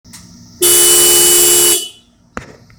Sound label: subi horn old